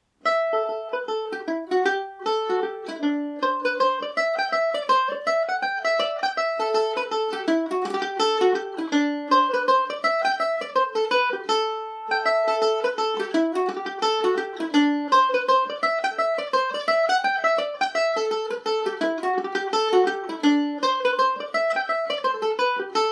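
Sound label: A dorian, Irish mandolin; hunters purse revisited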